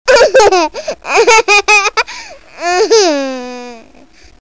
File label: GIGGLE